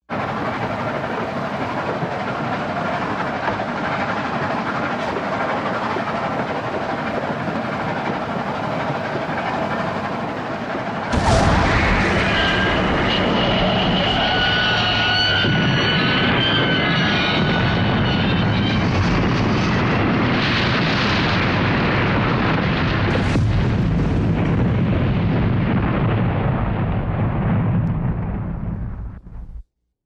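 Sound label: steam locomotive crash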